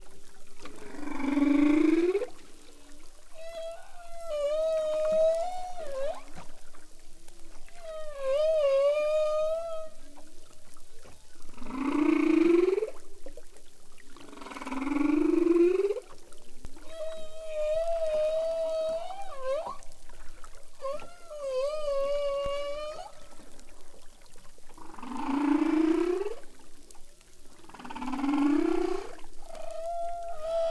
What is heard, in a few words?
hw song, humpback